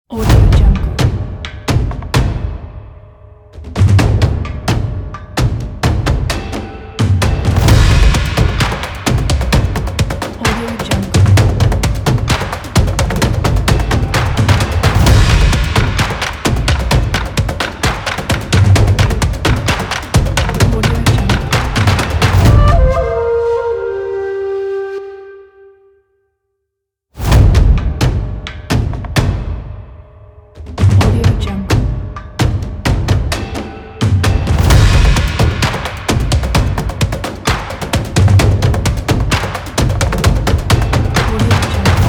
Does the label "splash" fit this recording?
No